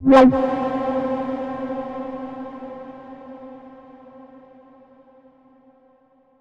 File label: 303 Acid C; Vengeance Samples